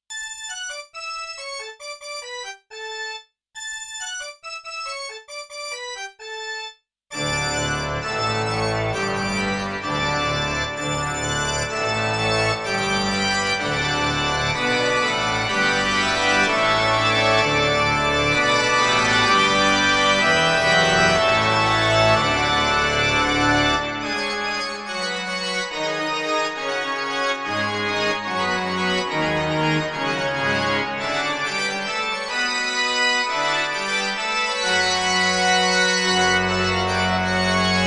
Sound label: Church Organ